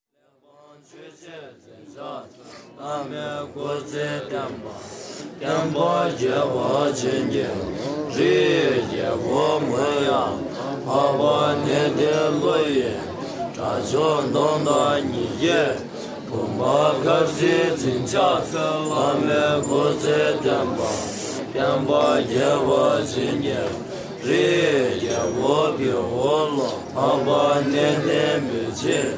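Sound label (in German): Lhasa Merunyingba Mönche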